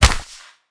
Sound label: flag